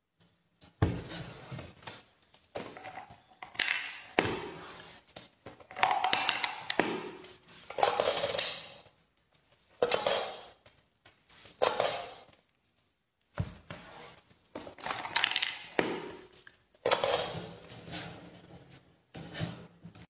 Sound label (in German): Vogel als Sortierer